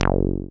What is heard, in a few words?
SYN 303, Roland TB-303